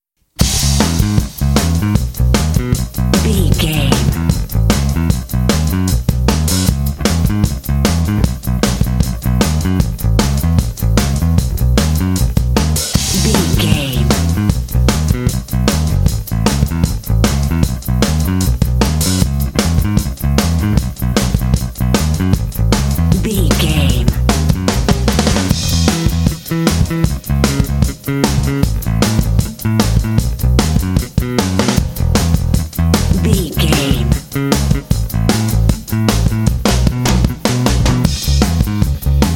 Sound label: ska craze